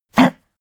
pug gag short; natur-tiere; hunde

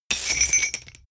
metal chain drop hard

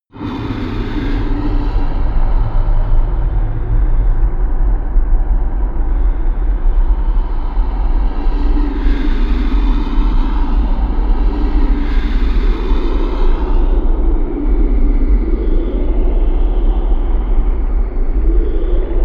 atmosphere voices from hell, Horror

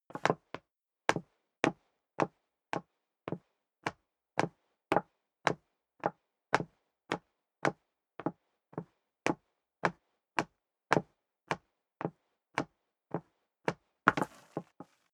step leather shoes wood parquet upstairs sequence; schritte

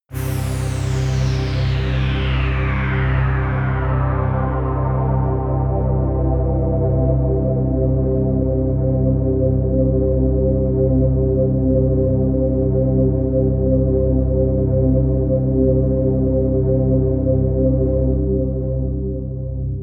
pad sweep wind; flaechen

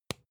spice jar smack close soft; industrie wirtschaft; gastronomie

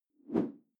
whoosh simple short tonal

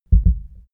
heart beat double fast

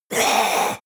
leprechaun gasp; koerpergeraeusche; comedy-cartoon; soundpool

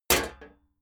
sheet clank damped

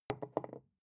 glass put down small wobble; objekte

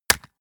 gun shot silencer wrist

